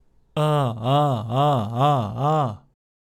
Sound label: vibrato; C; a5; チョキの声で練習していきます。